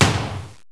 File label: Firecracker